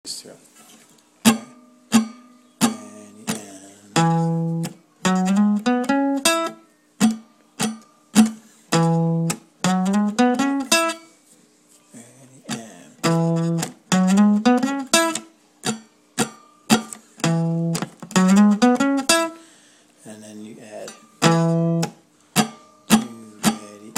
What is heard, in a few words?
solo